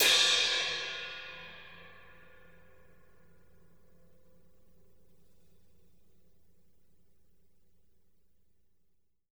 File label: ride